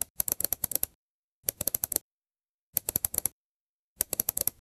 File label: mechanism slowly moves un